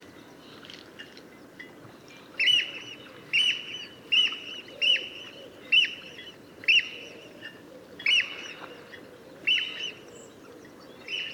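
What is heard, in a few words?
eurasian oystercatcher, birds in flight; plouezoch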